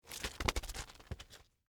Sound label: Roll Cabbage Head, Texture, Roll, Cabbage, Head, Vegetable; Food; Household